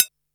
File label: Boss DR-660 Snare